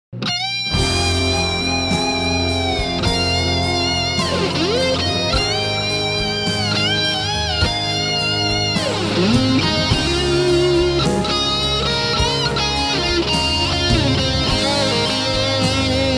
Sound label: solo EMG Strat Rams Head Bassman